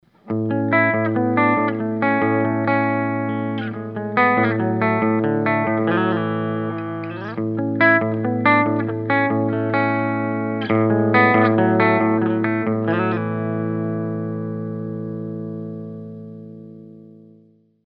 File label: clean, humbuckers; flashover